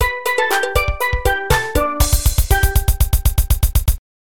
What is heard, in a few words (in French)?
Carillon